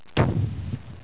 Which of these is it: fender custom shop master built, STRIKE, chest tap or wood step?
STRIKE